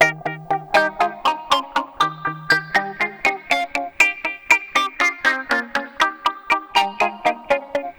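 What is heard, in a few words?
Guitars 120 D#; Zero-G Kocktail Kollection; Adrennalinn 2fers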